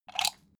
socket pull plug

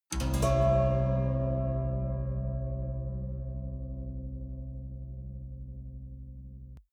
haunted strings chord major

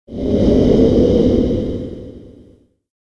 move swosh breath

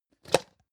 plastic can small lid open medium

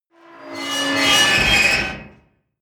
metal metal grind large

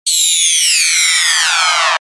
move down agressive

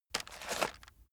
chocolates take pull

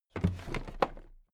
toolbox raise heavy

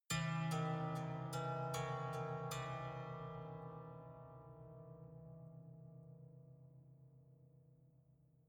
haunted strings tolling

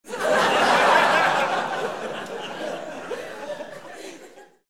laugh

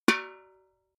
metal bucket hit ring small

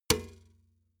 metal bucket hit bottom

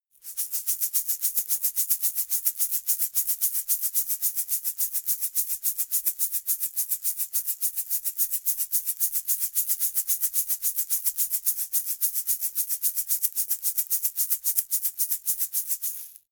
shaker plastic egg rattle slow hard

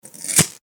lighter zap

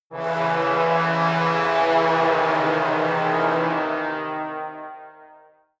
trombone fall

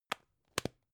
plastic spray can small close

